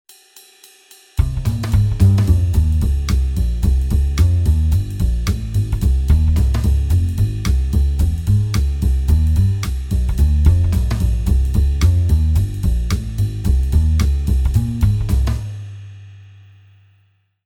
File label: Jazz backing, Guitar; Guitar Techniques 2017 Issue 272 August